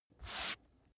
book dust off single

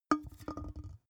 big can empty put down rattle

